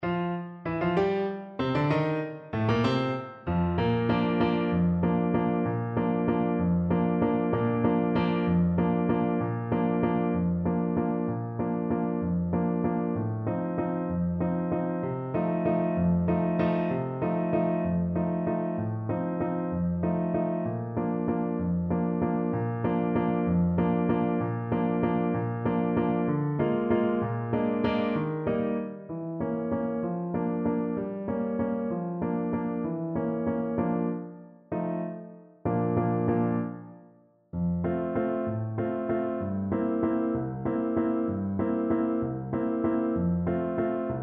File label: Voices of Spring, french horn